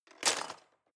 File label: knives wood drawer place hard